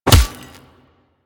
Melee Fist Impact Robotic Flesh; Warframe; Weapons; Fists

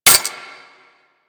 Low Clip Mech A; Warframe; Zylok Prime

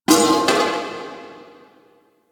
Asteroid Close Metal Hits; Ambience; Warframe; Grineer Asteroid

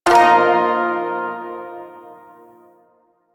Shawzin Pent Min Chord C, Music; Chords

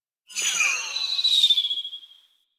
Bow Projectile

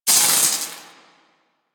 Sword Elemental Electricity Whoosh; Warframe; Teshin; Weapons

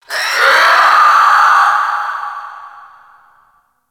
Infested Crawler Dist Vocal; Warframe

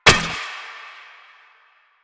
Updated Shot, Weapons; Warframe; Moa Pet; Multron

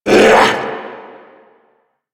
Vocal Attack Short; Warframe; Duviri; Dax; Vocal Set A

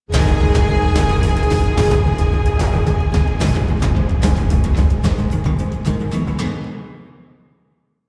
Mounted Intro Music

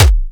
Weapon Punch Cannon; Warframe; Weapons; Weapon Punch Layers; Cannons

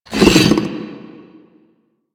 arm drag; Warframe; Man In The Wall

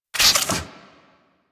Sicarus Prime Fire Tail 2D, weapons; DE; Warframe

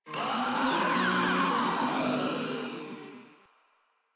Infested Ancient Death; Warframe; Proto Infested

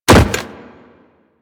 Land Soft Default, Footsteps; Warframe; Teshin

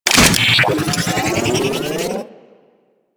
Arch Rocket Crossbow Reload Charge, Weapons; Warframe; Archwing

